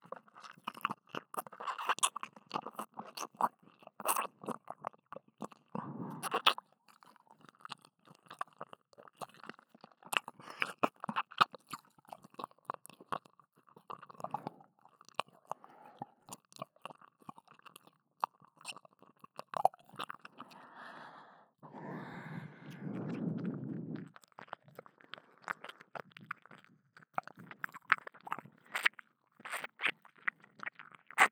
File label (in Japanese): 右耳舐め